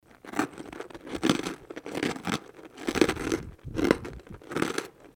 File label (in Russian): hrust suharej